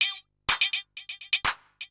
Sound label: PERCLOOP HOUSE 125; eJay